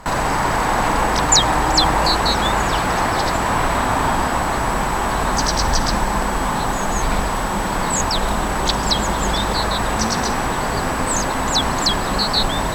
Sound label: Parus major only